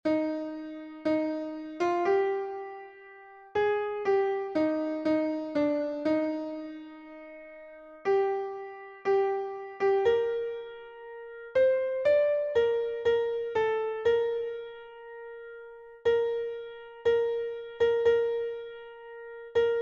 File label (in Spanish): SOPRANO, himnos en piano; cantos del camino